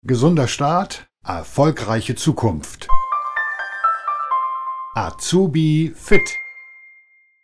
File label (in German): Audiologo mit Claim; AZUBIFIT